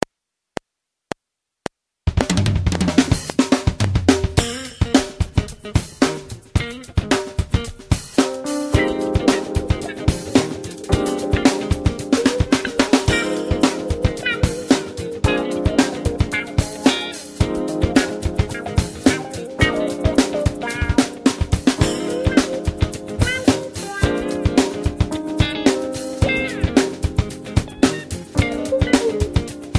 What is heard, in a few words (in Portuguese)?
improviso